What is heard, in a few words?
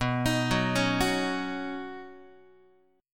B Major Add 9th B Guitar; Badd9; arpeggiated; JGuitar